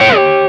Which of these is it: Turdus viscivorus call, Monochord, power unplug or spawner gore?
power unplug